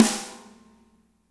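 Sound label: snare right; Spectrasonics; Burning Grooves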